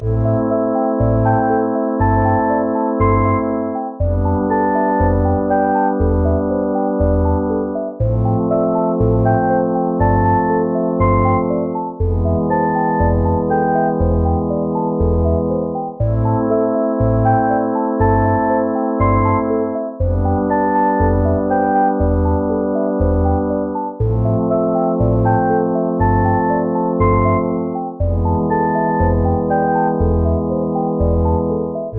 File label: ice ruins loop